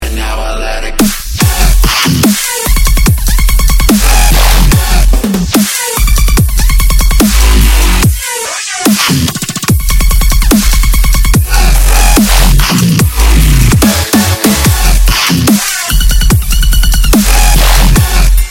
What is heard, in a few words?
dubstep